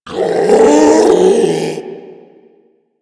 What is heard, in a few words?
Infested Ancient Strike Scream; Warframe